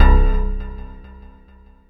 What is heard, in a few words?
TINE FX